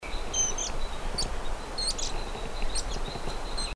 Streak throated Fulvetta, birdcall

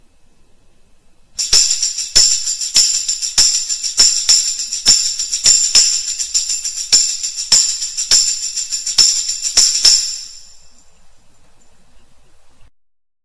tambourine

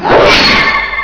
sword

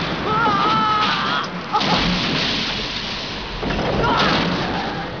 scream; japanese

